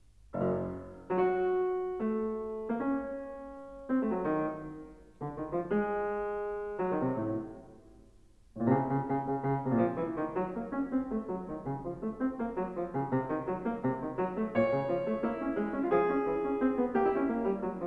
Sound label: piano sonata in b minor allegro energico fugu